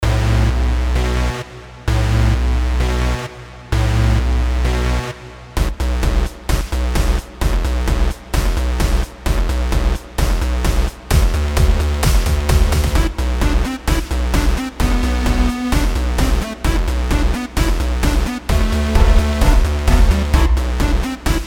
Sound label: Cold Steel Zone